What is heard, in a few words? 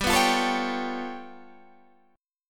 G 7th Sharp 11th G; strummed